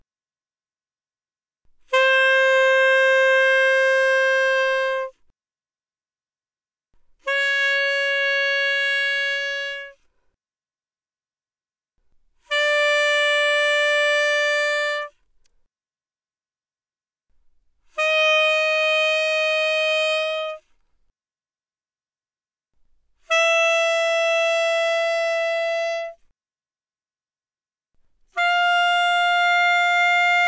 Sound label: Alto Sax No Vib ff C5 Ab5, alto saxophone